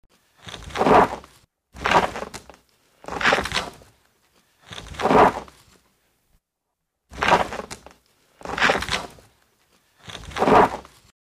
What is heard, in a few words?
Dragging a wooden object across the floor